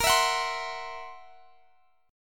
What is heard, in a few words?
F sharp 7th Flat 5th, chord; x,x,20,21,19,20